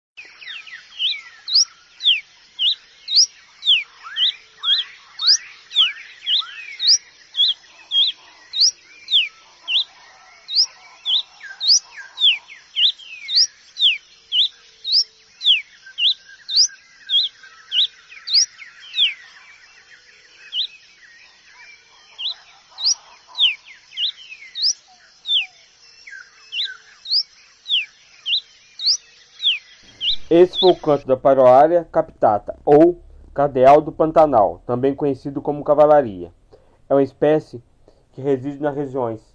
canto do cardeal do pantanal

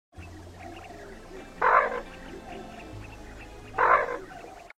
canto do cabeca de martelo

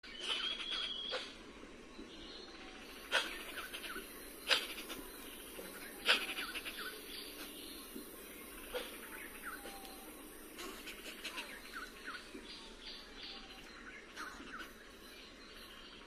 Canto do turaco de ross

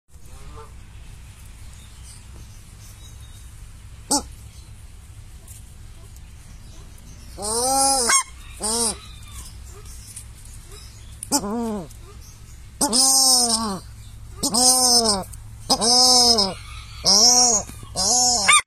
canto do pato do paraiso

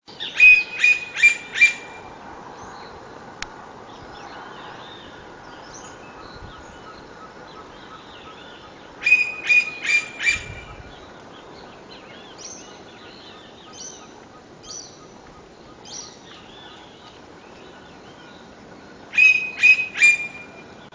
canto do pica pau de cabeca amarela

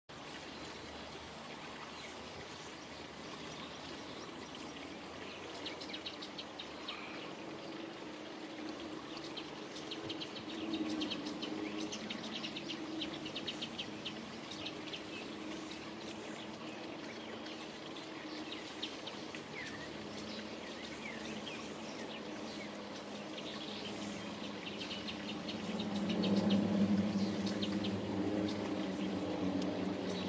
canto do tecelao baglafecht

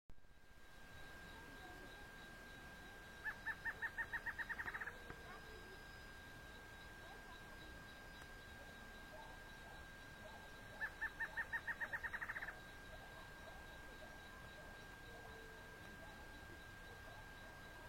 canto da choca do planalto

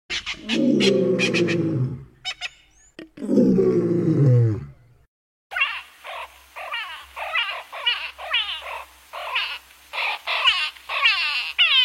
canto do tecelao das palmeiras dourado

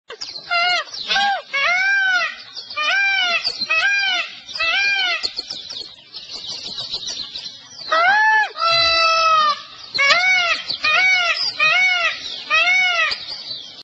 O CANTO DO PAVÃO

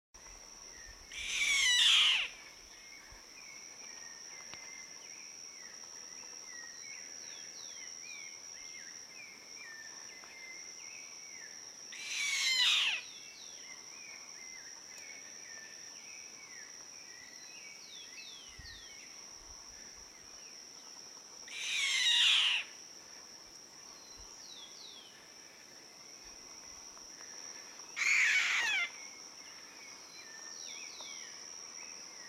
canto do chimango

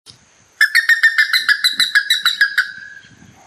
curicaca real

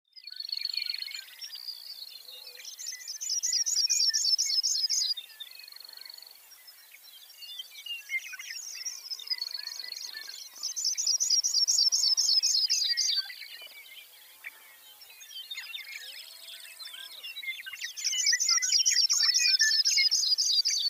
Canto do petinha de richard

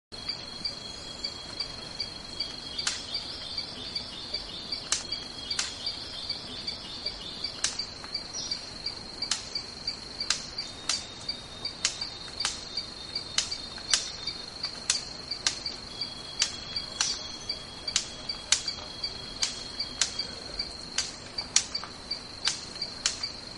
canto da soberba ave do paraiso

